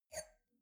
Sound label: wine glass grab table slide soft; berufe; gastronomie; soundpool